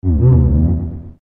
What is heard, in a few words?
eerie